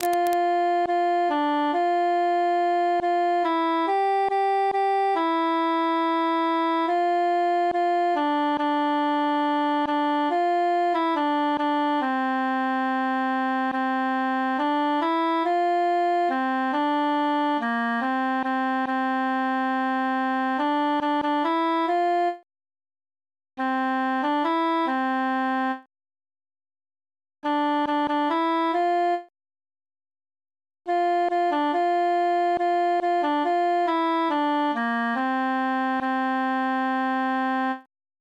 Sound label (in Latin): altus solo; tant que vivray